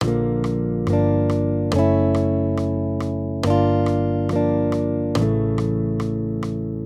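Accompaniment, Major Triads, Bb-A, 140 BPM, guitar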